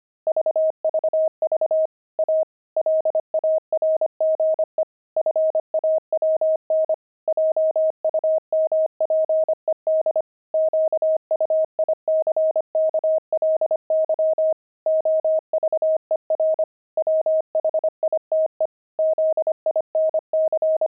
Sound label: pangram, intermediate pangrams files; fawn